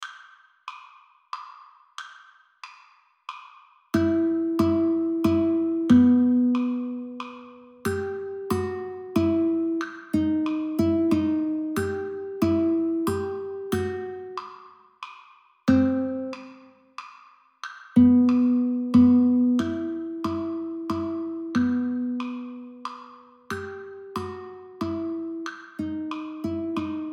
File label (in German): Hasellwoods Galliard Gitarre